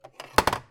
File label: Coffee Machine Open